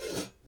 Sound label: hat